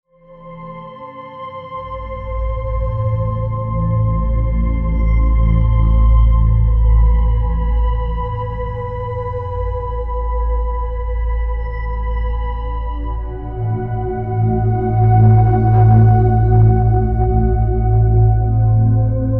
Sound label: Moon Strings